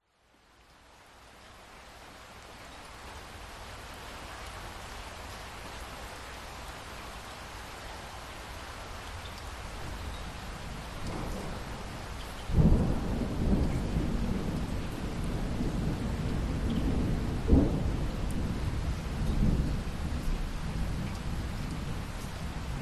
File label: nature thunderstorm aftermath light rain drips distant rumbles thunder